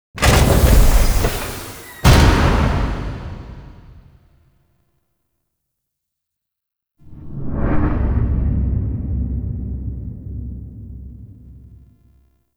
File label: Door Close; Cinematics; Midnight Oil; Warframe; Tau